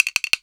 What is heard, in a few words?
CHIN TANPIKO, CHINA CYMBAL; AKAI S-Series CD-ROM Sound Library VOL-5